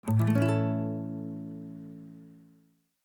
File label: strum; ریتم دو چهارم سنتر